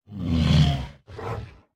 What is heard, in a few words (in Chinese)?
北极熊待机声